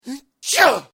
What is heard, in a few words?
Sneezing Male Sneeze; human sound effects